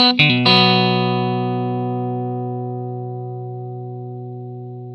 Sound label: blues guitar riff 120, Vintage Blues Guitar; USB Soundscan vol.22; AKAI